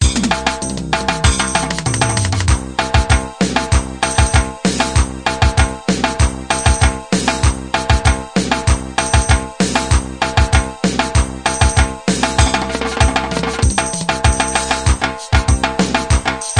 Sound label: kaherwa mix, grooves